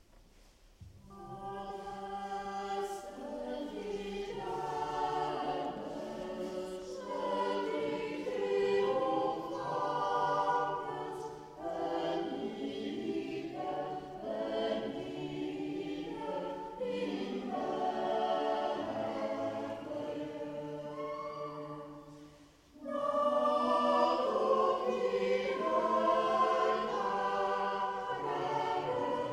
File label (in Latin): Adeste fideles Cantate Domino, Koncert kolęd; Koncert kolęd w Nadarzynie